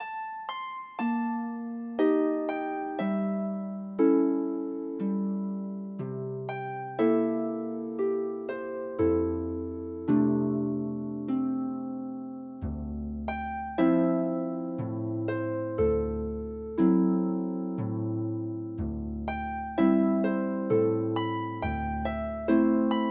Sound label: harp